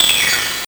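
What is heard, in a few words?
Game Boy Perc, DRUMS; ChipShop Deluxe; ChipShop Hits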